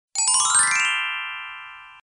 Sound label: correct